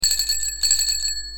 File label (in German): Fahrradklingel; China